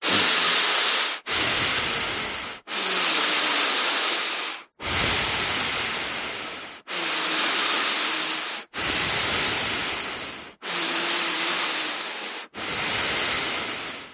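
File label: human male breathing in and out through nose; zapsplat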